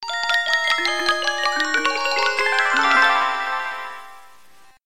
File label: lg c3400 shutdown